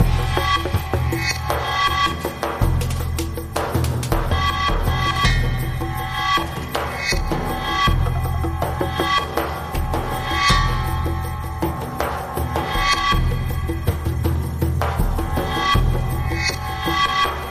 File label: Scratchy Sand